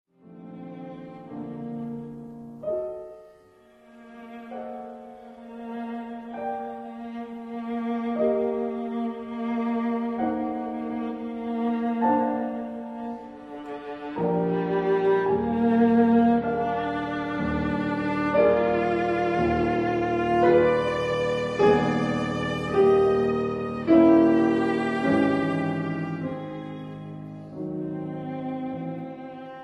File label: Sonata for Viola and Piano in E flat Major Op 120 No 2 III Andante con moto; Brahms; Allegro